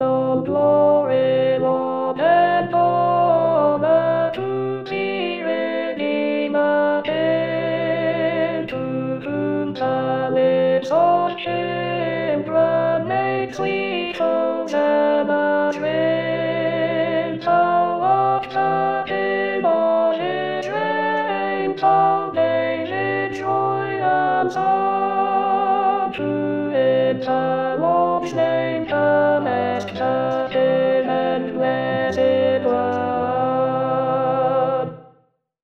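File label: All Glory Alto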